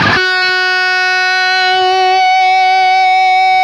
LEAD F#3, Guitar; East West; Steve Stevens Guitar Samples Collection

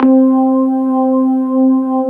B3 HAM, Keyboards Old School

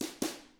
BOSSA, Drums

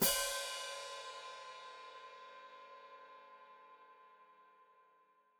Hat Closed Open, Hi-Hat; Cymbals Stereo; Deeply Sampled Drums

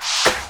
SD, dance drums hip

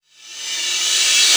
NOISE, Production Effects

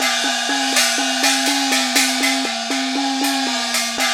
PERCS 120BPM; INIS - Opium

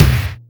MANIC KICK; Drums In Your FACE!

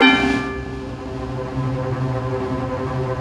HIT, HITS

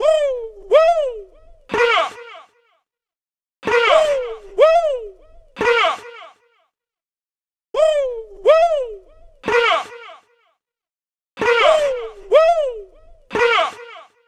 Woo Woo Vocals; Sonic Foundry (Sony Creative Software); Club Joints and Street Anthems; Game Time

